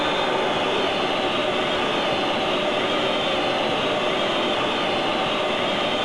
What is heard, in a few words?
boo